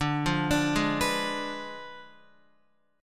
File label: D Diminished 7th D down Guitar; Ddim7 arpeggiated